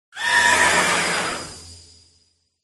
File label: cry